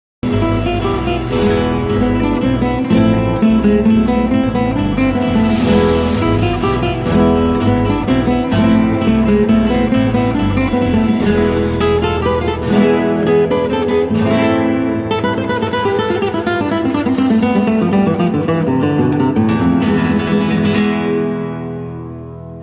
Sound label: Spanish Guitar